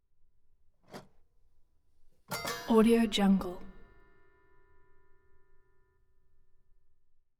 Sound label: toy piano multiple keys ascending; prosoundfx